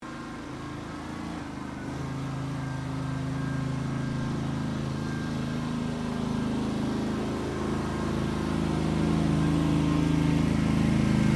Cleaner Honda Gxv530 Polyvac Approach Track Withdraw, Gas powered floor cleaner; Cleaning